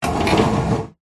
household computer stand metal slide on wooden desk; zapsplat